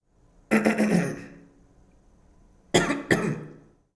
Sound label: Man clearing throat